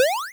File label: button Cursor; gamerip; marble maid